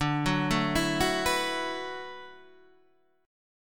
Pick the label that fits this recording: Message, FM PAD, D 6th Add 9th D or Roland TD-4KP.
D 6th Add 9th D